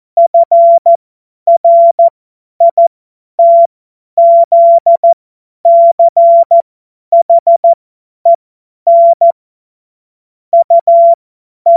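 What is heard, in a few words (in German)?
Witz, cw morsen; Morsekurs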